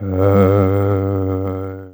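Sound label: zombie; sf effects; sinfar haks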